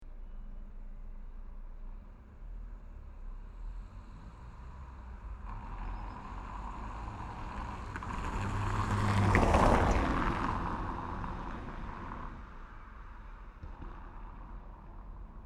Europe France Paris City Single Car Passbys Cobble Stone Road; City Sound Effects